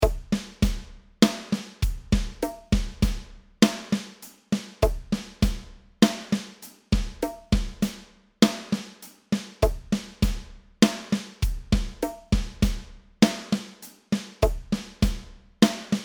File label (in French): mise en place main ghost notes 50bpm